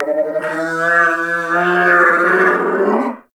Lure Call; Warframe; Predasite; Hunting Critters; Characters

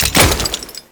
Royal Guard Death Knee Drop; Warframe; Grineer; Foley

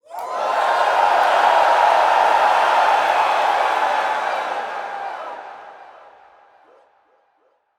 Heavy Cheer, Crowd; Lunaro

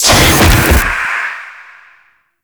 Teleport Cast; Warframe; Stalker

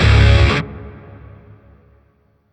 Shawzin Hirajoshi Chord; B; Warframe; Grineer Shawzin

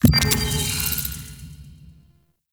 Lock Success; Warframe; Sacrifice; Minigame

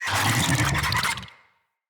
Infested Big Ashen Vocal Attack Death

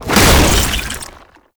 Underground Attack Stop; Warframe; Abilities

